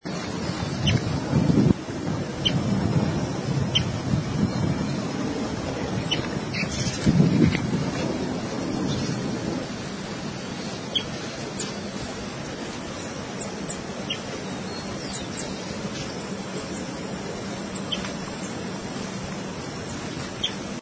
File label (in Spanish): voz fiofío pico corto